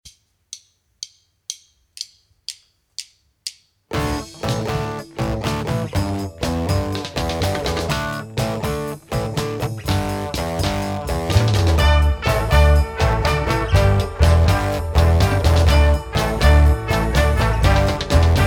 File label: marching band mix master